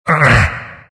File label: grunts; Dota 2; elder titan